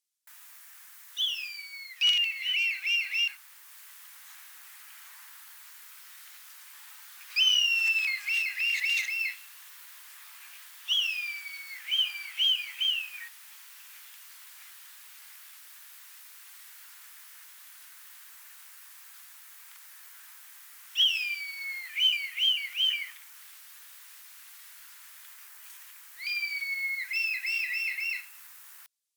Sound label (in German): Rotmilan Ruf